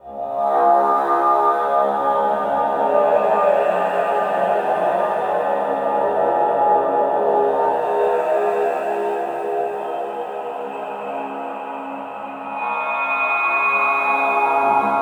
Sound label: CHOIR METAL